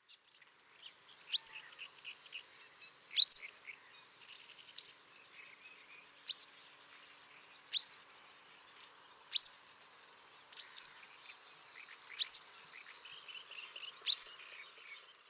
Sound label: motflafla call; liepaja mailrags; a.celmins